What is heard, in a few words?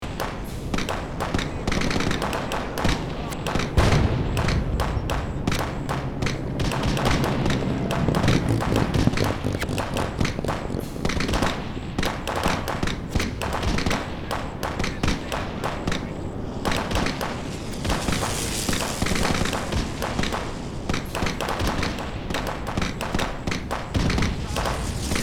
Gunfight with electrical disturbances